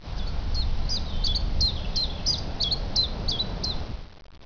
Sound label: chiffchaff